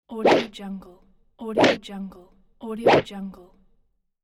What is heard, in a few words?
golf swings